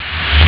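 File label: snare drum up; Amiga Soundtracker sample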